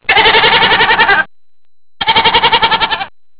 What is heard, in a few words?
Bleating Goat; Innostream INNO 55